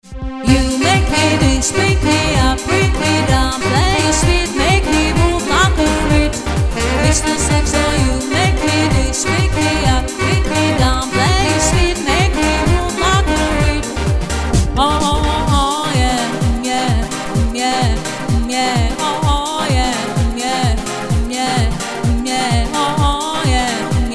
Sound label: Sax solo